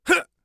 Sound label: lightattack, Warrior Voice; Warrior Voice Pack